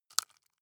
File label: wood chip small break creak